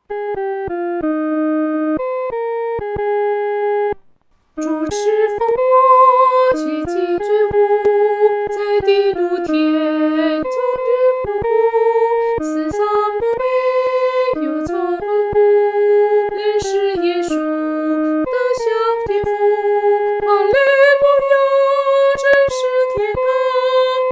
独唱 第一声

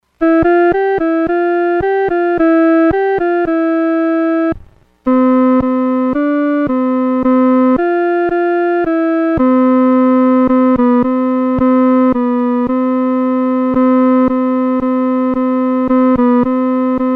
独奏 第二声; 基督今复活

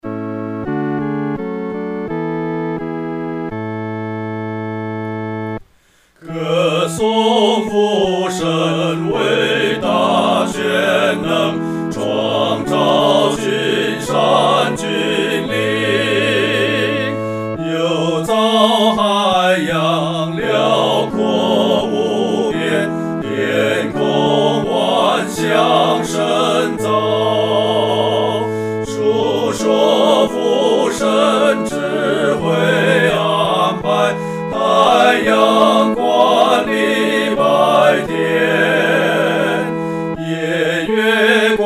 合唱 四声部

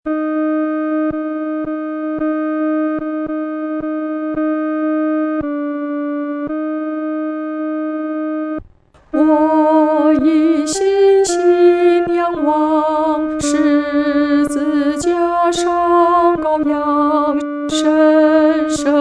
独唱 第二声

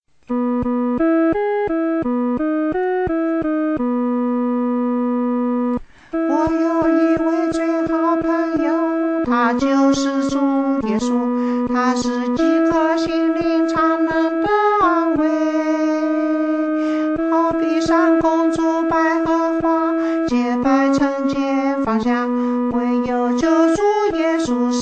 独唱 第二声; 谷中百合花

